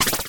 slash quick

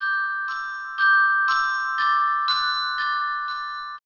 twinkling lights

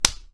slap

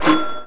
pinball

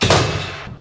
repair

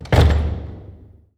use lock

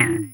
bleep fail

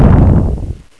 boom

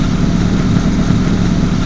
engine

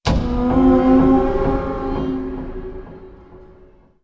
plastic ghost

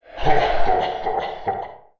boo evil laugh

